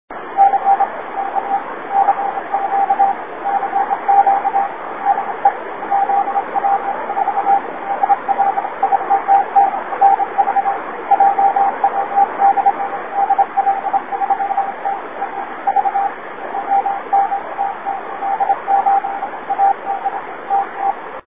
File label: 6m; vhf